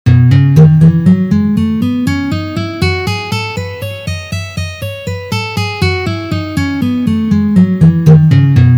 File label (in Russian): Gamma Mi mazhor Tri noty pozitsiya